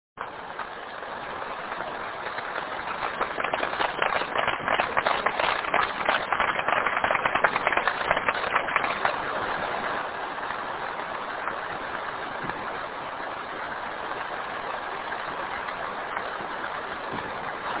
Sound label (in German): applaus